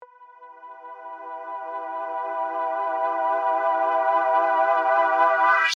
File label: Keys; PatchFactory; Stranger Things